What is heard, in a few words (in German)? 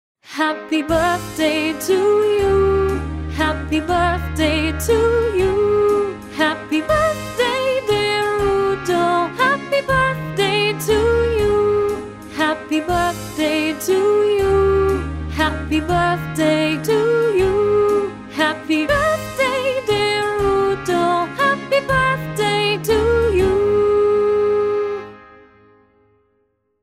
happy birthday udo